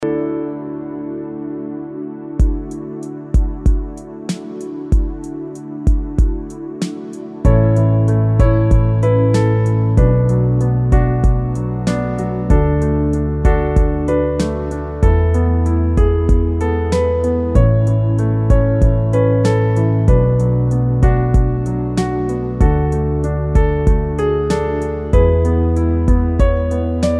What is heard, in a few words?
A little bit piano